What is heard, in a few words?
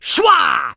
clown throw, clown dodger; Ringling Bros. and Barnum & Bailey; Wii